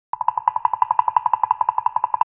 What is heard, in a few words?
Cartoon tiny running; cartoon running and walking